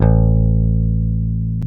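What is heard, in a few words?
Bass Electric; CMI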